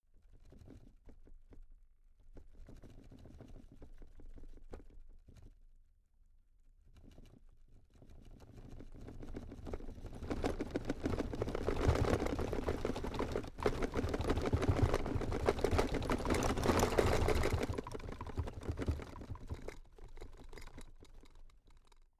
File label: drawer cabinet stuff rattle